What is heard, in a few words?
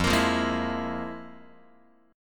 F Minor Major 7th Double Flat 5th, F, Guitar; FmM7bb5 strummed; x,1,1,3,0,3; 4A,4E,5A,5D,5G#,6C#